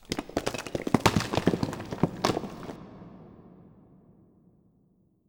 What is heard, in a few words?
Rocks; Inquisitor 3